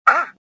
jump, worker; worker1

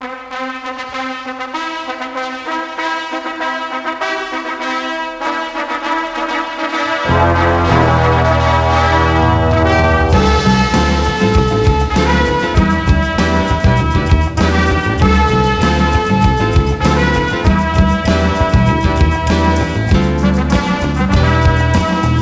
fanfare; Rocky; XBOX

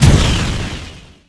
Rocket Fire, Rocket Launcher

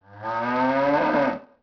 cow; Ambient; MX vs ATV Unleashed

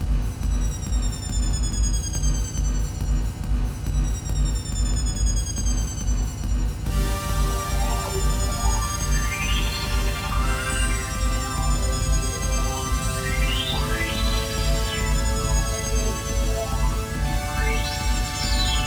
music race; TyTheTasmanianTiger3; XBOX

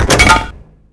Skull Glass Hit; Finding Nemo; XBOX

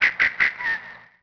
amb bird; Tony Hawk's Project 8; XBOX

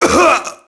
merc death; enemy; Max Payne

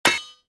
bounce, thermal; weapons

